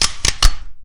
shotgun pickup

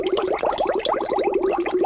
drink

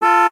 car horn; zapsplat

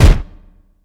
pistol fire

